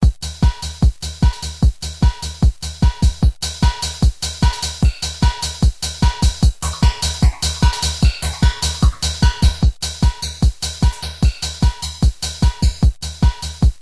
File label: techno